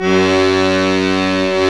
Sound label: BASSO F#2; Roland - LA Composer vol. 1